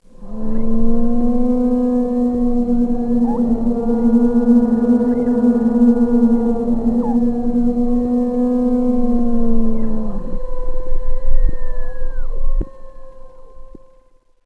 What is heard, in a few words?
Scary Wind; Ambient; Sid Meier's Civilization 4 Complete; Beyond the Sword